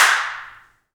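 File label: PRC HNDCL, hand clap; Rhythm Section; Clap & Snap; Clap Modules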